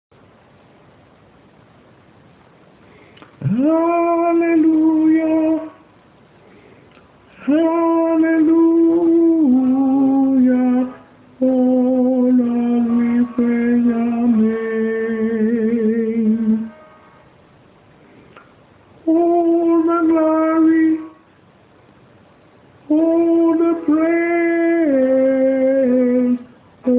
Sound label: hallelujah tenor